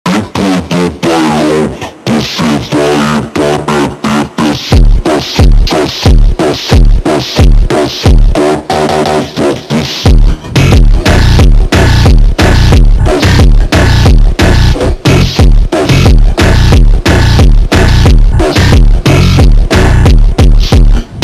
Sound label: batida escura slowed reverb